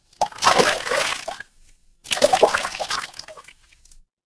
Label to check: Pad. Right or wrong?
Wrong